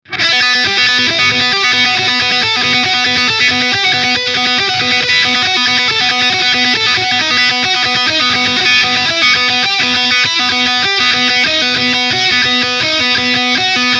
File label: Full Gain Lead